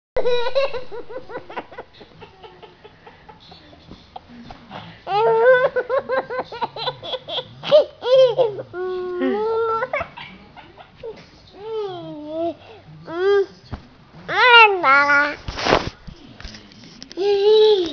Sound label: laugh